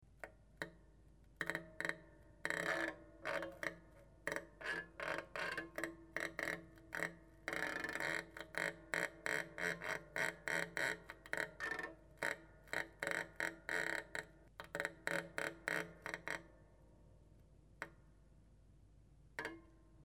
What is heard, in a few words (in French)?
archet grince, harpe; touchers de la harpe